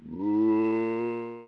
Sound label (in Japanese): suzu